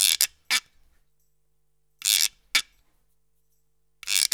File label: Roots Reggae; Sounds Good